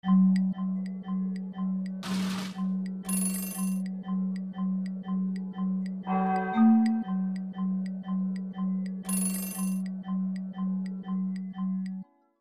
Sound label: winter dry; ICAD2003